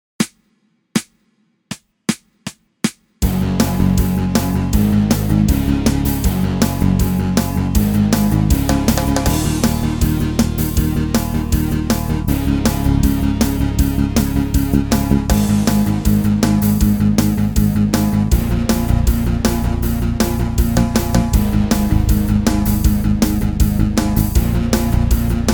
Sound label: Grunge; curso de teclado piano